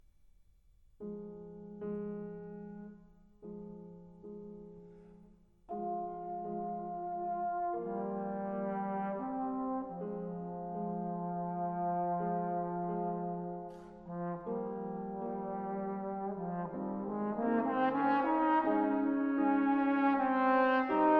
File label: Andantino from String Quartet